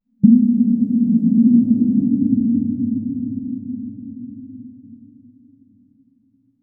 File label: atmospheric light burst